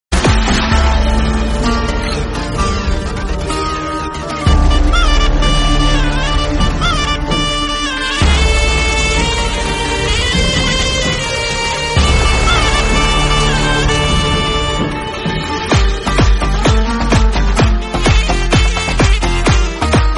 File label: Bgm; Lucifer; Mohanlal